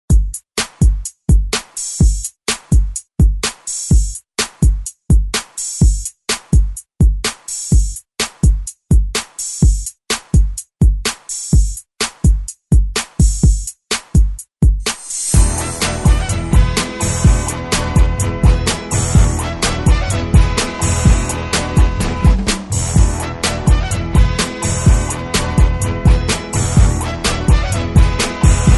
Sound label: 60s, 126Bpm; DJDOLLS; ReDrum